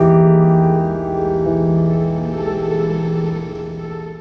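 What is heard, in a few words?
Acoustic Piano; Keys; Keyboards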